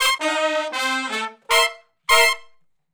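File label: R&B Riff (C) unison, harmony; Sections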